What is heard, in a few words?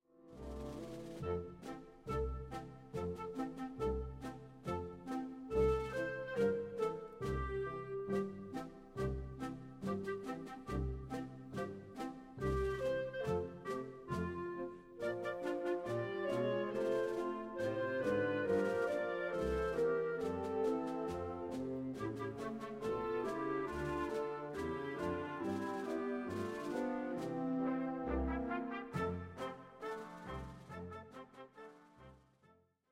lullaby of the drums